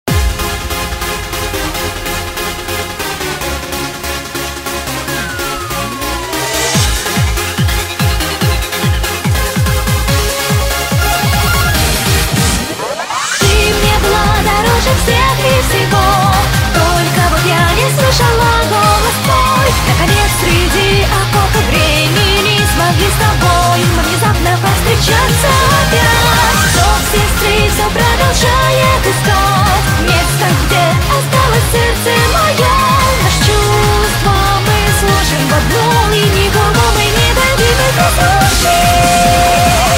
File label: Russian cover